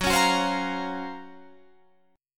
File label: Gb Major Sharp 11th, Guitar Drop D